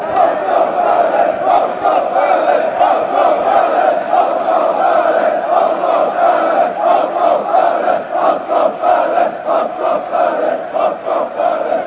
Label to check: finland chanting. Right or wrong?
Right